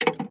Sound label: click ok; Hearts of Iron IV Death or Dishonor